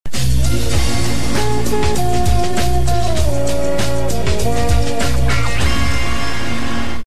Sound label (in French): Jingle comblage; Autoroute Info